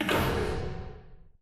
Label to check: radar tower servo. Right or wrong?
Right